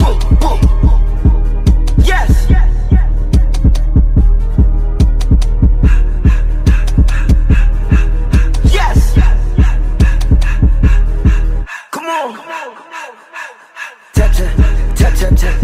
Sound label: sped up nightcore remix